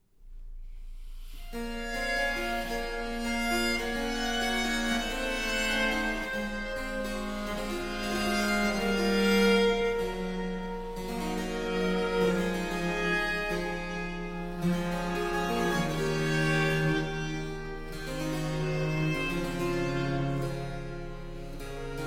Concerto For Piccolo, 2 Violins and Basso Continuo in C Major, Adagio